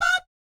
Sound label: "bap", Jazz Fx, F#5